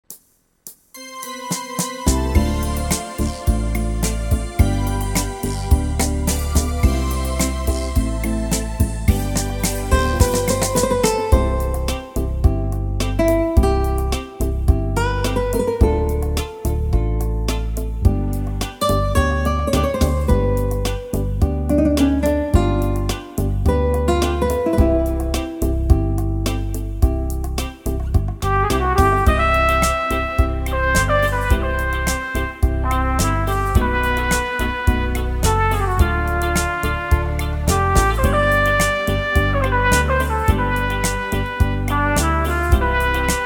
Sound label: rumba